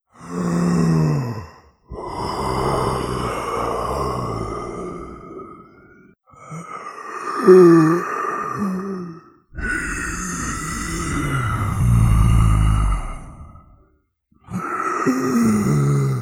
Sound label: Beast Breath